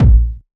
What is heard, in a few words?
OSH Kick